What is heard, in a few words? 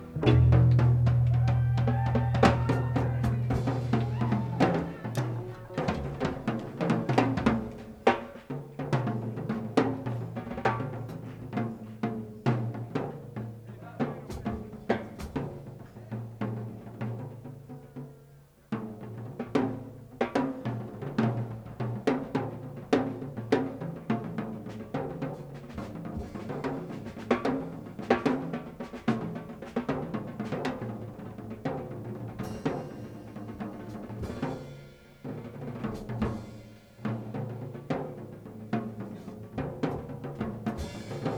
Drums; Fillmore West, San Francisco, CA